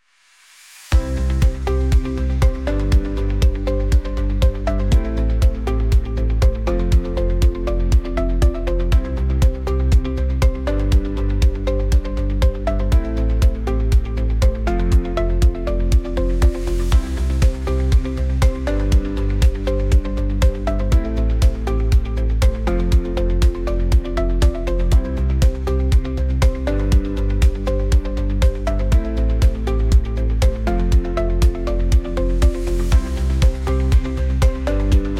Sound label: Glitchy Groove